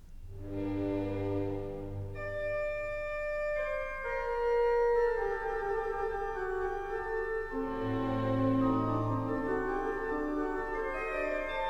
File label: Organ Concerto; Handel; Op4-2; HWV290